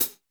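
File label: Pedal hi-hat, Drums, Roland JV 1080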